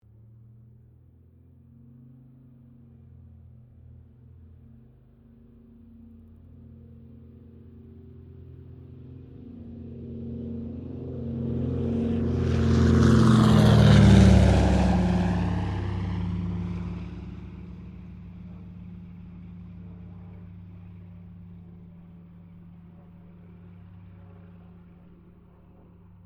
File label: AIRCRAFT DOUGLAS DC3 PASS; Qantas 1942 DC3; Airplanes (Sound Effects:Vehicles)